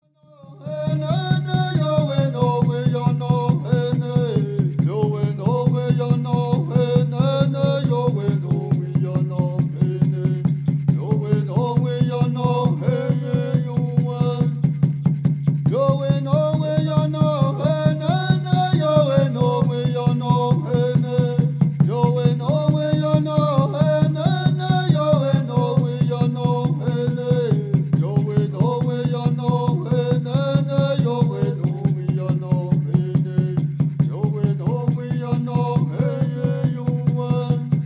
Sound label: Straight Song